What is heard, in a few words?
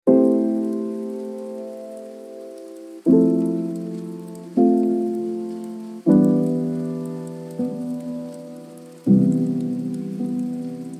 Rainy Jazz